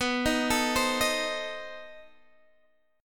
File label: B 7th Flat 9th, down, Guitar, Open G